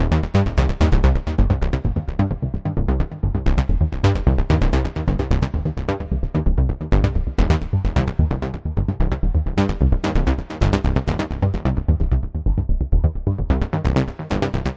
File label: 130 big analog bass filtering around, bass loops